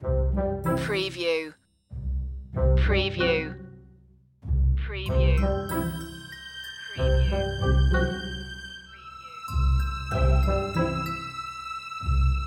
no percussion

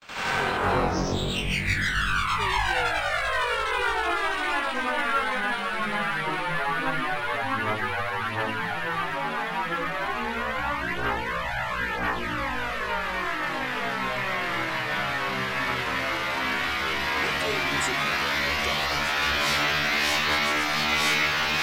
Scanner Drone Hard; sound effects bible

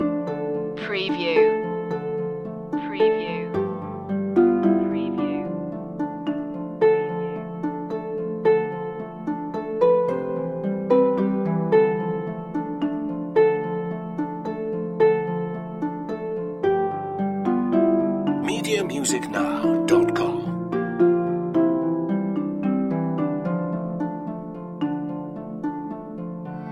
medieval tale loop

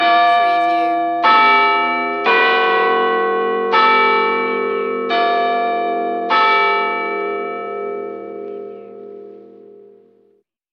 Church Bells Short Melody

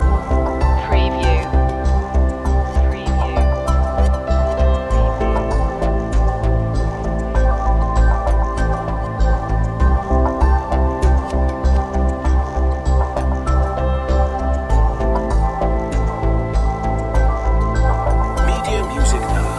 digital evolution ambient bg loop

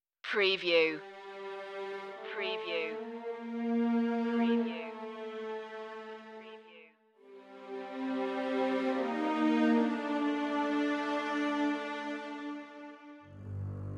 violins phrase only

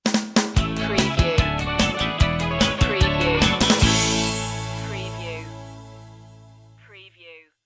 reggae stinger

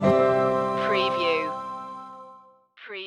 piano dream stinger

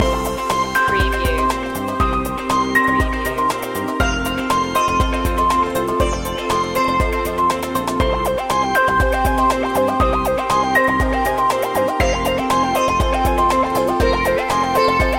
solar wind loop

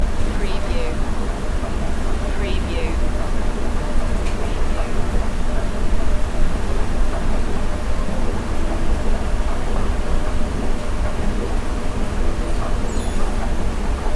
Escalator

